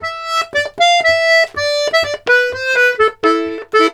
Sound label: Accordions, 120 laride; USB Soundscan vol.40; AKAI